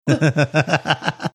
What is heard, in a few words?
laughing